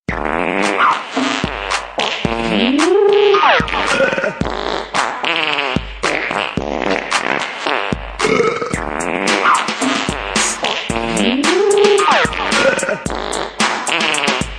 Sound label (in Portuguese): peidos arrotos mix song; peido e arroto remix